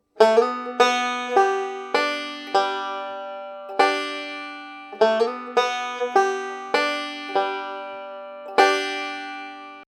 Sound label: banjo licks